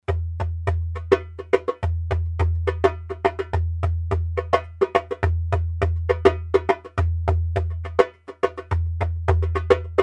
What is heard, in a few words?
aboriginal drum 6-8